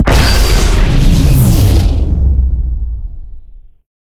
Death Wall Fire; Evolve; Elder Kraken

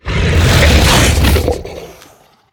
Sniff, Behemoth; Evolve

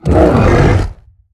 Pain Light; Evolve; Behemoth

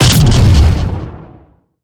Banshee Missile Destroyed; Evolve; Elder Kraken

